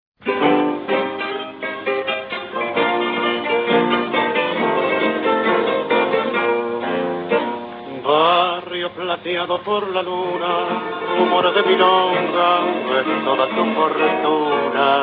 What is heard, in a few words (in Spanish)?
Melodia